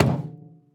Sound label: footstep armor; Footsteps, Armor